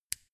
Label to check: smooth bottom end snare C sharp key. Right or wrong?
Wrong